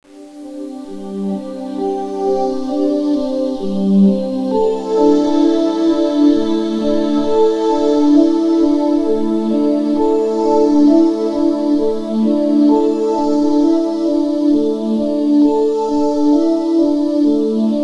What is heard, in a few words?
Mono 8-bit